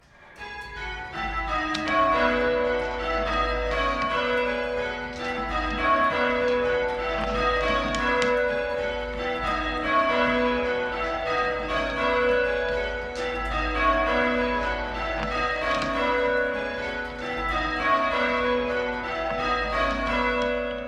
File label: Rounds, All Saints Tower; Ringing "rounds" involves the controlled ringing of all bells, six in our case, from the highest to lowest.